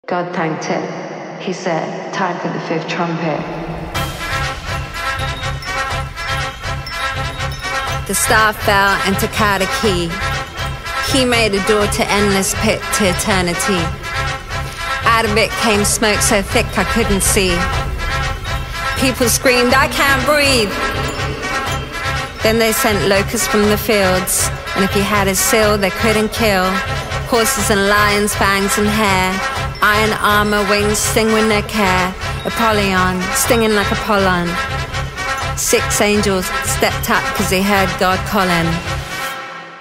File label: TRUMPET